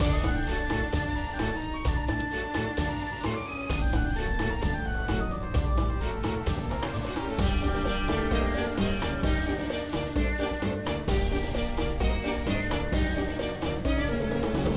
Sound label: BGM boss snake; Rage in Peace